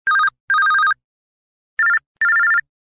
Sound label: Tone; LX370